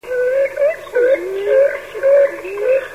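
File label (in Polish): modrzyk, chrusciele rallidae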